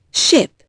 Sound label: ship